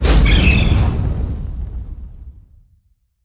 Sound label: Podium SFX; Heatwave2024